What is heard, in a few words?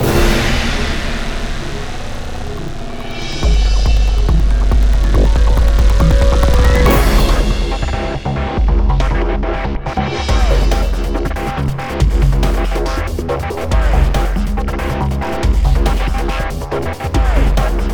Music Vehicle Chase